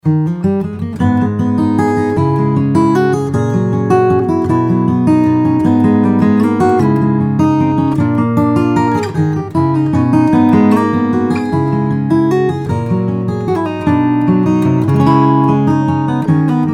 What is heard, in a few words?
French waltz fade